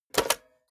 cassette player slide tray take out medium

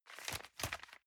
envelope swap medium

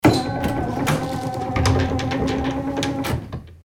door automatic

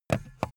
stapler pickup

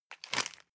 plastic bag small grab medium

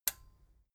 metal button stereo release enable

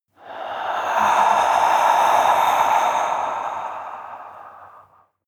breath female exhale heavy scratch

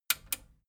metal button radio click enable slow

